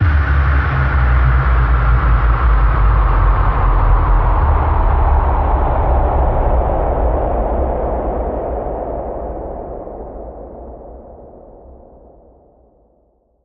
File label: Sand Dunes Windy Downer